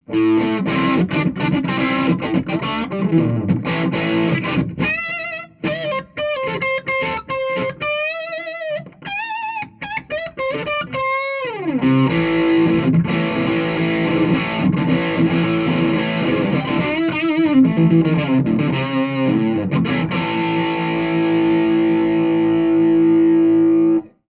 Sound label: hydra gain up